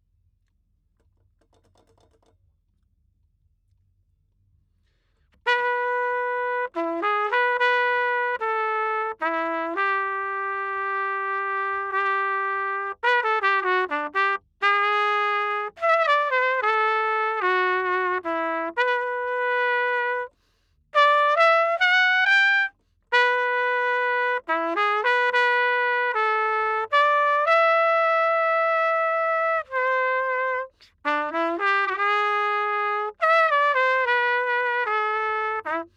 Trumpet

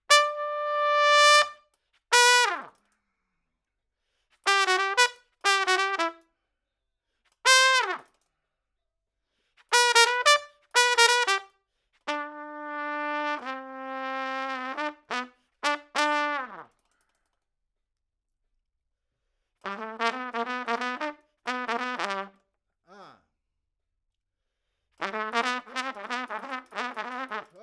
Trump

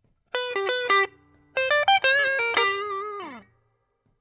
Guit Solo, piano

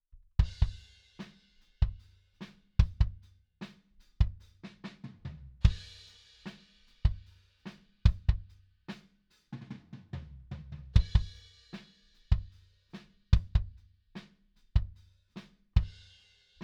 Kick

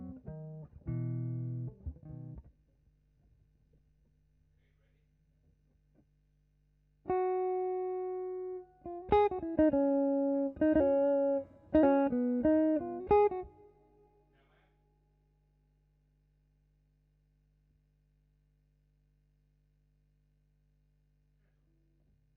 GTR B

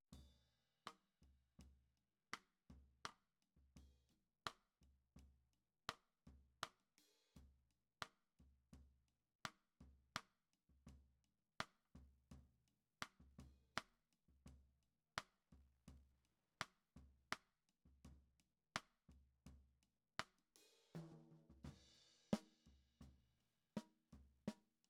Snare Top

